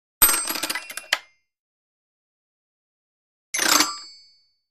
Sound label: Toy Bell 2 Wooden Mall, bells